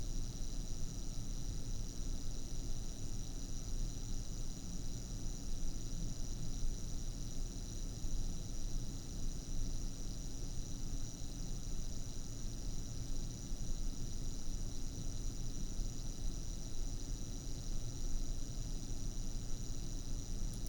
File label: noise sample